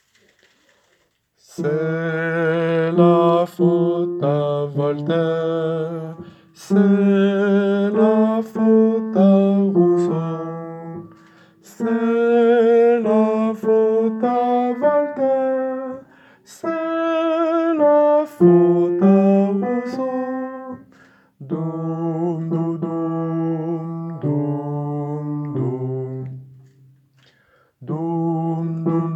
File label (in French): ténor